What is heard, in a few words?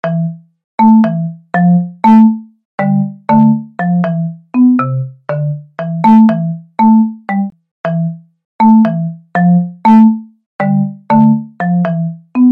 iPhone Ringtones